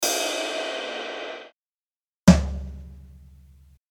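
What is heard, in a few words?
Wet Drums Ride Floor Tom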